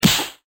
Fire Punch Impact

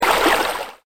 fountain

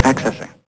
ACCESSIN

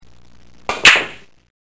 BILARDS

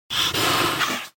snake att

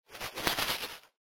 brush

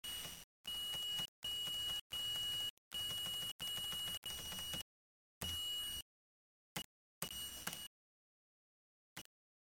cash reg

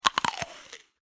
CRUNCH

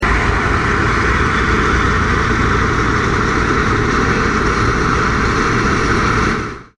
pipe

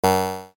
beep

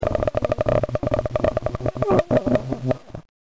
CRACKLE

